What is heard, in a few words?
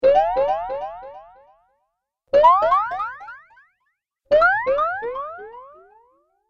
Cartoon Hit And Jump Sounds; Cartoon Sound Design Sound Effects